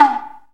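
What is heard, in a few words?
kotsuzumi; AKAI S6000 CD-ROM - Volume 5; TUZUMI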